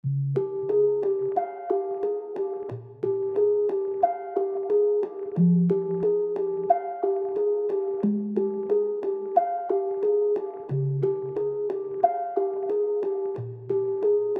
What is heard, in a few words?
Latin Melody Loop F min 90 BPM Synth